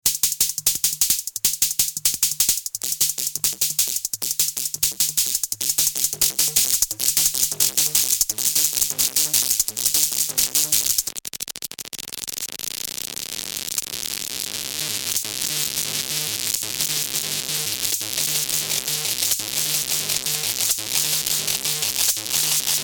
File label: drums; paralleluniverse